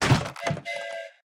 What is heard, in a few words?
Drop Toy Train; Lethal Company